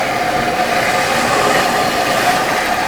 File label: plastic box scrape smooth loop; physics